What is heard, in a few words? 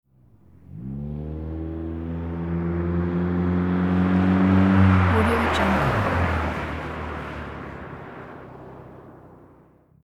street race car fast approach and coast around corner